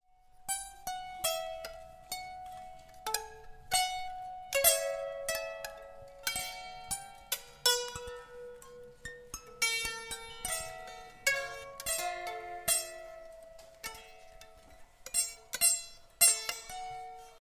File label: valiha